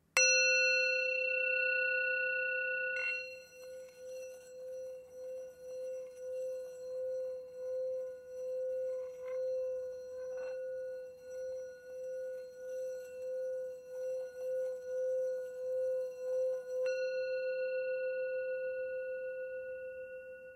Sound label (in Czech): tibetska misa